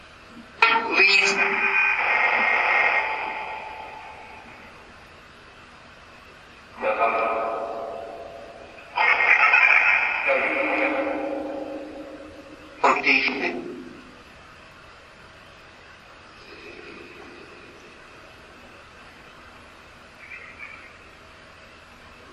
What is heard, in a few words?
Phasma Box; beaver area high school investigation